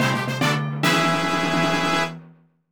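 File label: Fanfare Win Battle, gameplay sounds; "Win Multiplayer Battle" fanfare (Phantom Hourglass: "Get Spirit Gem")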